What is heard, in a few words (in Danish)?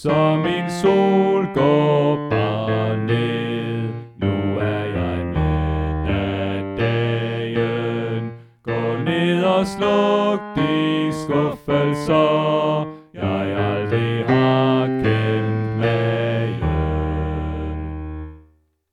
Bas; oevefiler